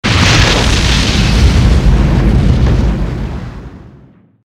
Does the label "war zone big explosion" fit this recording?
Yes